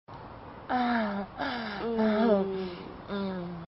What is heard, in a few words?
lick, moans; The Witcher Hunt